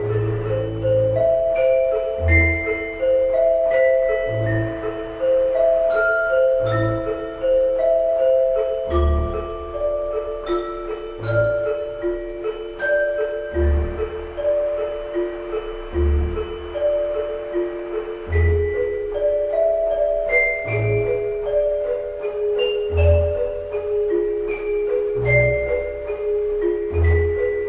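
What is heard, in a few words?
keys xylo and vibes, sad loops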